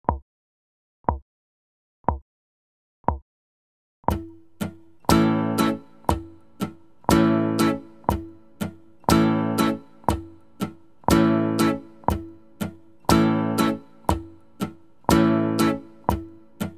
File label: strumming instrumental